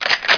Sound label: gun pickup